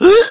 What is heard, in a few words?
piglet fear; Bad Piggies